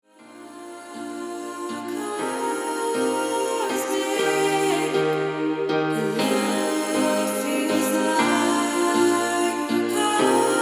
Cosmic Piano Version

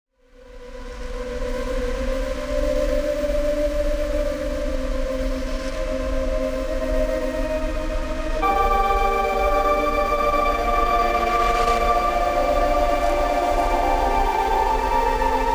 Music from Organ

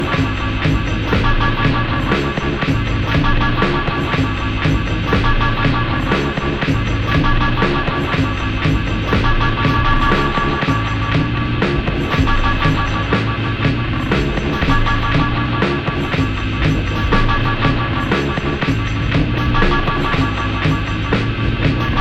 Depression Dub

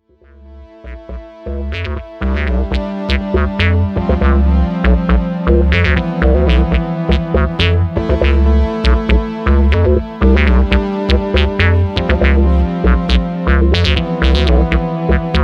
Mono Sequencer Bass

